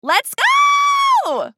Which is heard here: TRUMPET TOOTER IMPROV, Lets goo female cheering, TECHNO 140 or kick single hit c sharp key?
Lets goo female cheering